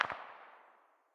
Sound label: pistol very far distance; 45acp; tacz-tweak-pack; taczmechanics